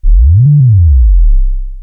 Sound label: Synth oneshot Sub Sweep Up Down, Synth Bass; Slate Digital BASSHOLE Sample Pack